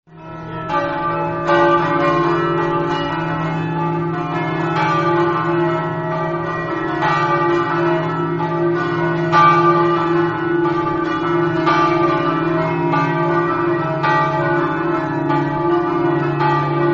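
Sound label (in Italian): campane, church bells; godia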